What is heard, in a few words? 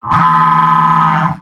Pyro jeers